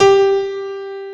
AC PIANO, JV 1080; Pro-Rec - Synthsonic

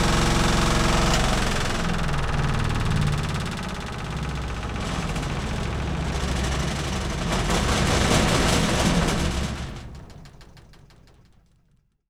DRIER 2, LAUNDRY; AKAI S-Series CD-ROM Sound Library VOL-6

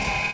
DR. LOOP; X-Static Goldmine 3

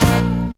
tuners

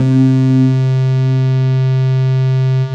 C3, SynthLine

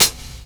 Hihat; Sonic Foundry (Sony Creative Software); Stylus Pressure Urban Grooves on Digital Wax; Lenny Hendrix

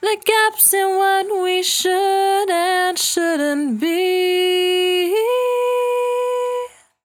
68bpm D, Velvet Vocal; Zero-G

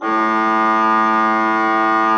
A2, Cathedral Organ, HOLZPRINZ.; Propeller Island

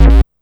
Synth Hit A

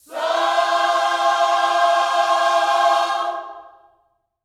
SOUL, Gospel; Spectrasonics; Vocal Planet; GOSP PHR

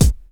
DR. LOOP; X-Static Goldmine 3

